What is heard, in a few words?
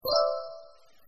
customer orders; Delicious Emilys Wonder Wedding